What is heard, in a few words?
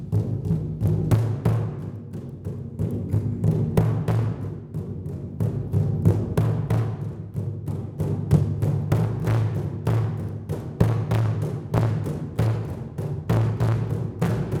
bad drum clip